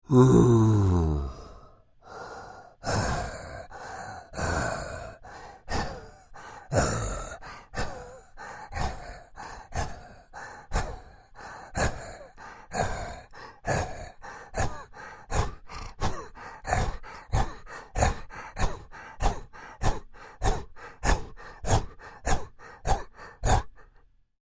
Creature Breaths Active, Human; Breathing; BigRoomSound

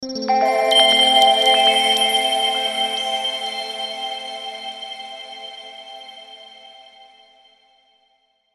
Logo Melody Bright Delay; Sparkle Sound Effects; Blastwave

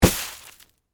Snowball Impact, Weather, Snowball, Impact, Big; BlastWave

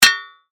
Impact Metal Shovel; Crashes; Objects; BlastWave

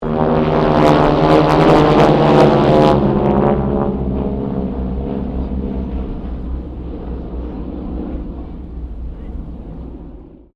AIRCRAFT HARVARD SQUADRON 3 PASS DISTORTION; Airplanes; Vehicles; Southern Knights Squadron

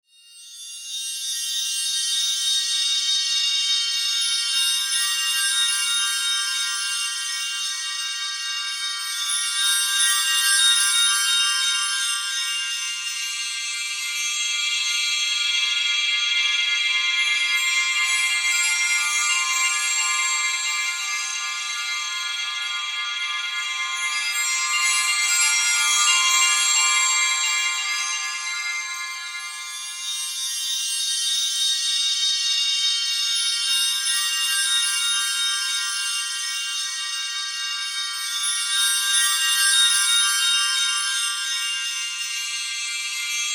Bed Chimes Reverb Wash; Bed Sound Effects

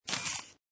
Camera Motorized Lens Cover Closing; Cameras; Electronics

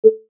Rollover, Short; Mouseovers (Sound Effects:Multimedia)

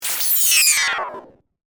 Glitch Digital; Sound Design; BlastWave

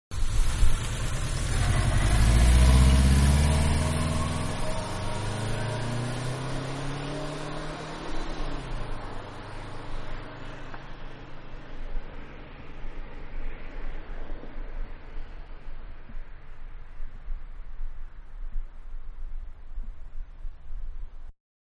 Mid Size Car Drive Away Exterior; Cars (Sound Effects:Vehicles); Car Mid-Size-Drive Away-Exterior; Manhattan Production Music

